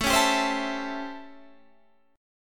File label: A 7th Sharp 9th A, Guitar Drop D; chord